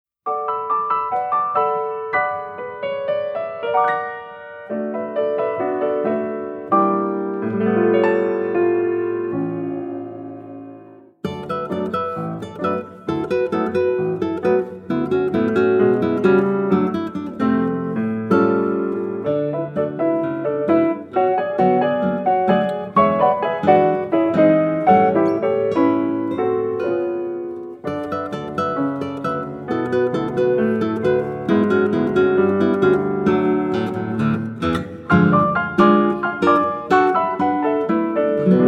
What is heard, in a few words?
Novelette piano guitar